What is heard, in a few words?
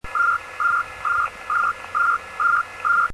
amt, digital modes